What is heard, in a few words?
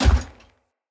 Heavy Grunt Boot Walk Default, Footsteps; Sinecure